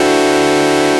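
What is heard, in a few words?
8 bit status point dinging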